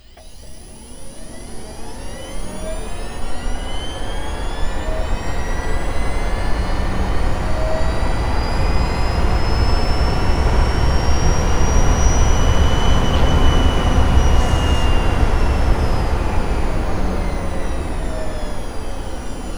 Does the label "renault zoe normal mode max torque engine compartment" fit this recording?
Yes